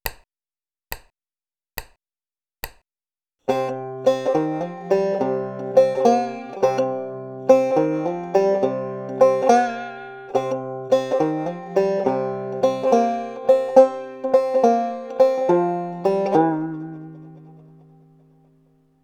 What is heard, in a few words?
blues lick double c tuning